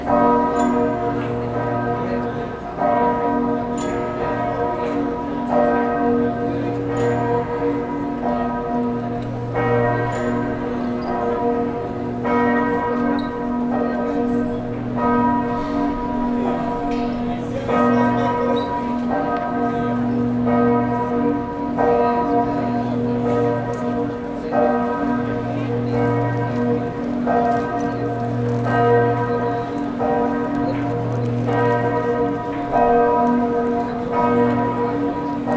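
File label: campanile, Venice; olaszorszag; harangozas; szent mark ter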